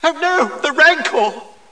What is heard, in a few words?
mice; Games World; New Game Levels; Duke Nukem; filmwarp2